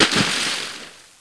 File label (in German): wasser; Tomb Raider PS1; lara